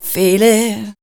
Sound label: voice spectral, ly short